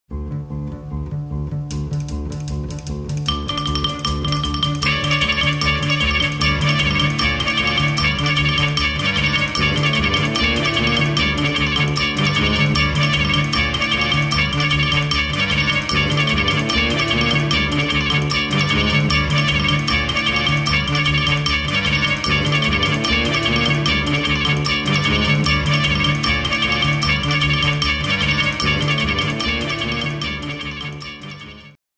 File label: sounder long; WINS SOUNDER. Complete with no voice-over.